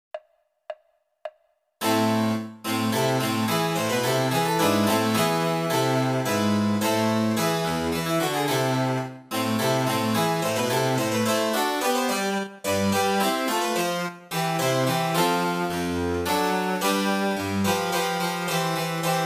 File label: oboe sonata a-moll; telemann